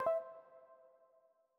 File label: Classic UI SFX Short High; gameflow-deck